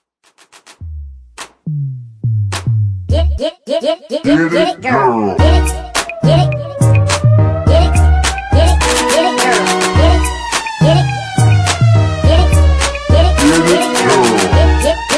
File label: instrumental